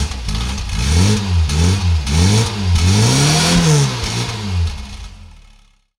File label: car engine rev